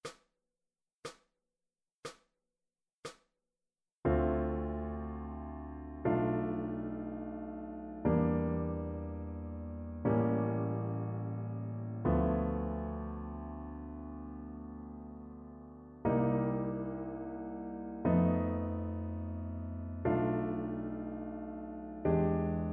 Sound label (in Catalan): Audio Dictat harmonic Jazz i Musica Moderna